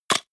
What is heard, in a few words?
medieval click medium neutral